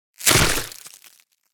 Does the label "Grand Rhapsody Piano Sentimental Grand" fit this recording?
No